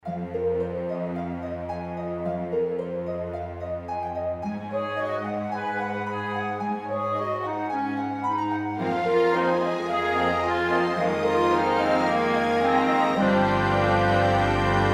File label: Democratic Jig